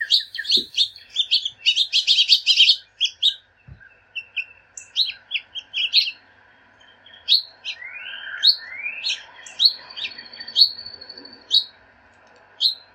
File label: House Finch